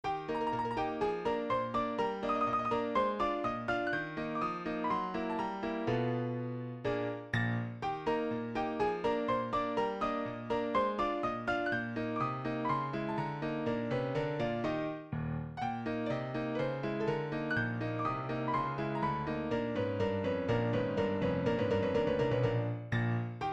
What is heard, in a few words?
silent film music